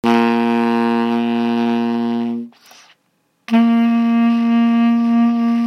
Octave C Tenor Sax

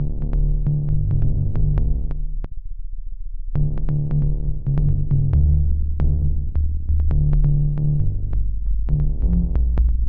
Wet aer bass sine wave

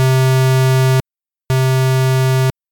Single square

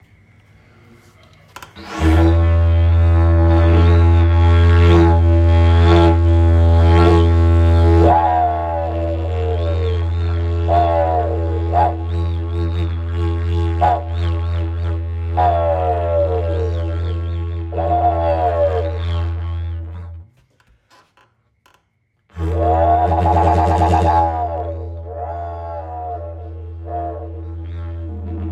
The sound of the Didgeridoo

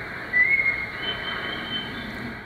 60khz call

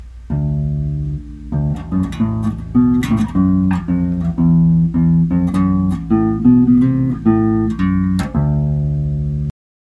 FULL MID AND TREBLE

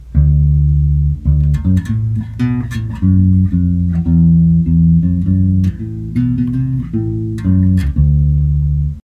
FULL BASS

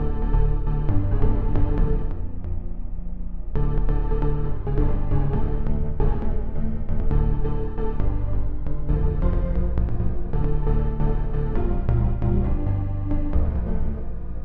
Wet aer bass amber wave

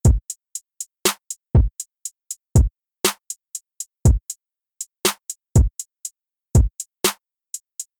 50MS HUMANIZATION DRUMS

